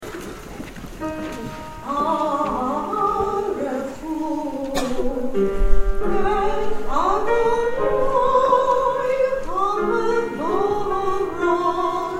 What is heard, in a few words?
Barchu; Yom Kippur; TBS Yom Kippur 2013